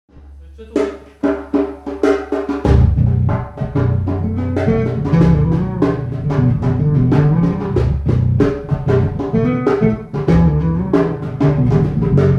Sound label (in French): Basse Cajon